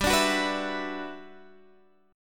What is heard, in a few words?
G Major 13th, Guitar Standard; strummed; capo 9